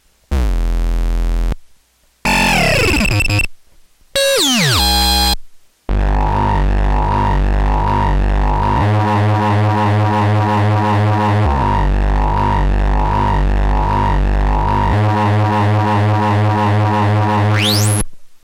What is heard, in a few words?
distortion